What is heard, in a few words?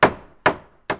Quit Sound